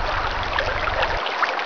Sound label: fountain